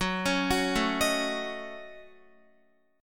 F sharp Minor 6th F sharp Guitar; F#m6 arpeggiated; x,9,11,11,9,10

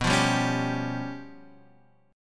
Db 7th Flat 5th

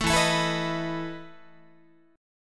E Major 7th Suspended 4th E down Guitar

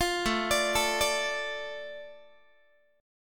A Suspended 4th Sharp 5th, A, Guitar; Asus4#5; arpeggiated; 20,17,17,19,18,x

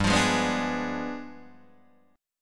G 7th Flat 9th, Guitar, Open D

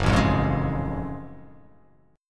Gb Minor Major 9th Gb down; strummed; JGuitar

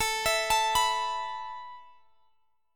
A Suspended 2nd down Guitar Open G

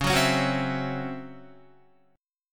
Db Minor Major 7th Double Flat 5th Db down Guitar; strummed; DbmM7bb5; JGuitar

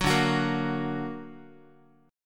D Augmented Guitar, chord; strummed; 9,10,9,8,x,x; JGuitar

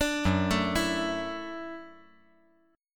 Gb Minor 7th Sharp 5th

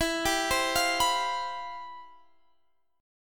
C Major 11th E

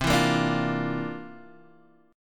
C 11th C down Guitar Half Step Down

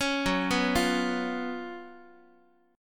Gb Major 7th Suspended 4th Gb Guitar

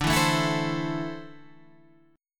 D 7th Suspended 2nd down Guitar Standard

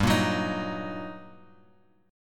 G Minor Major 7th Sharp 5th, guitar chord; GmM7#5 Chord {3 1 4 X 4 X} strummed

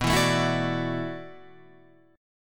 E 7th Suspended 2nd Suspended 4th, B

